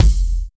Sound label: rich top end kick single hit F key; kick drum samples